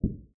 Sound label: cushion collision, Snooker